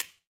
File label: glass crack